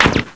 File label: fmj hit; cstrike; codlife